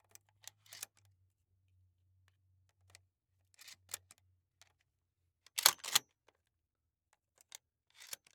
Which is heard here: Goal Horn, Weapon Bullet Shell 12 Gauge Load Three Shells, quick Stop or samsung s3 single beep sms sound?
Weapon Bullet Shell 12 Gauge Load Three Shells